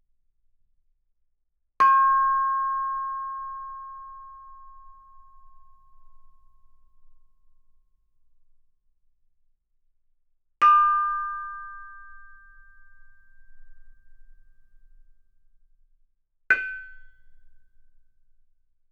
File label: Gamelan; Propeller Island - Complete Gamelan